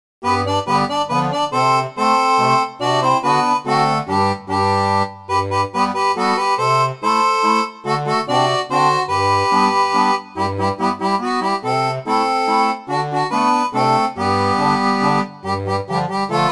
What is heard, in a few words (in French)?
orgue barbarie